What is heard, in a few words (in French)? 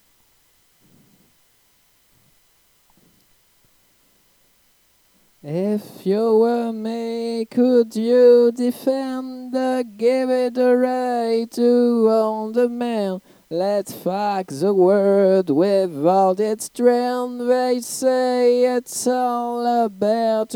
refrain deuxieme voix